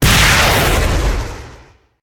rocket fire

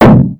grabber impact world

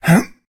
jump

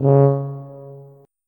tuba note

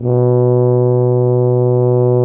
tuba loopnote

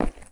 footstep

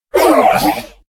death, player

